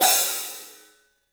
CYFX, EFFECT CYMB; 3D Sound; 3D Drums

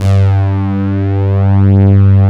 ANALOG, WAVESTATION; Greytsounds VOL-1

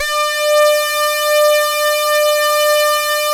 SAW RES DRY, SYNTH

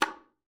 D4, alto sax; Xsample VOL-2

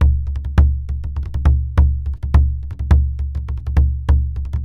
Bodhran, Celtic

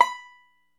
ARCHTOP, Arch Top Banjo

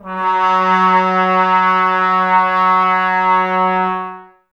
brass, Tbs Mute; 90 s Sample CDs; Miroslav Vitous; Woodwind Brass Ensemble

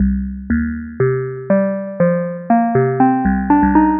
Dark Tine, Synth Textures; Sonic Foundry (Sony Creative Software); The Electronic Music Manuscript Collection

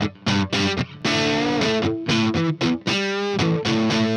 Riff Guitar, Indie Rock

